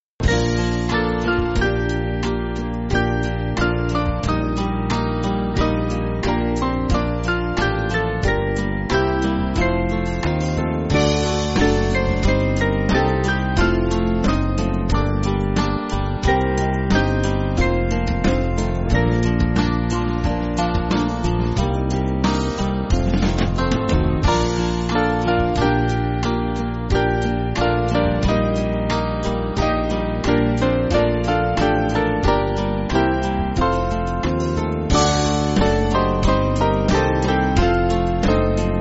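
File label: Band, Backing Files for Churches; None Other Lamb; All Hallows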